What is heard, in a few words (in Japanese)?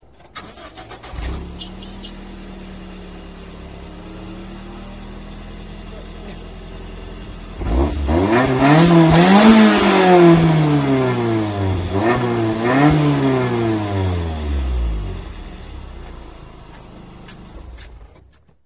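AE101, 101LEVIN純正 レーシング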